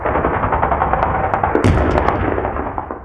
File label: city battle, streetwar